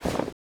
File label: Idle Cloth